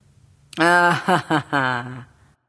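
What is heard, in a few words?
disagree, female; smite; voicepack; hera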